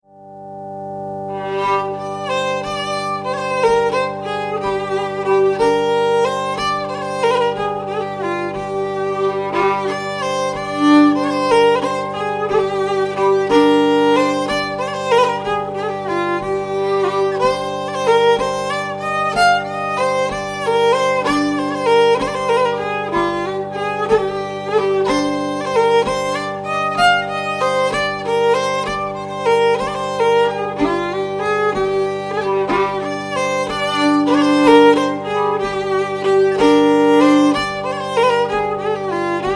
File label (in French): Valse sombre